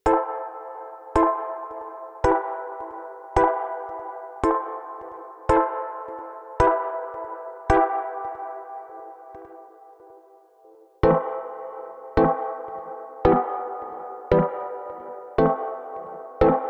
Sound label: Dub a min